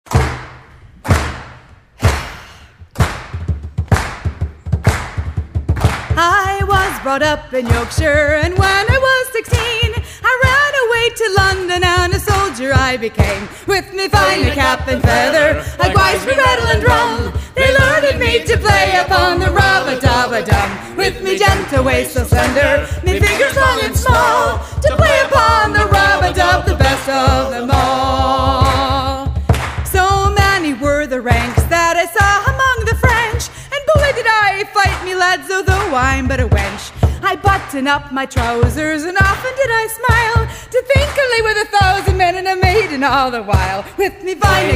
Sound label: drummer girl